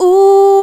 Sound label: FEMALE "OOOH", VOCAL